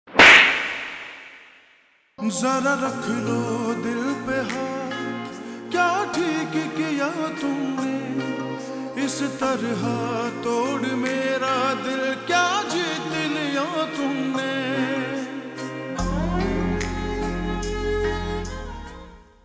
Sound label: Slowed Reverb Ringtone